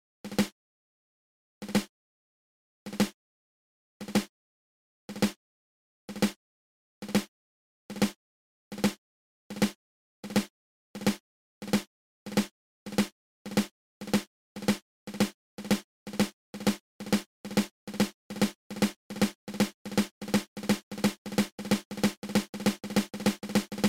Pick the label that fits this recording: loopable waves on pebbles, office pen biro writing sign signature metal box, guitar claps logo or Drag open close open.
Drag open close open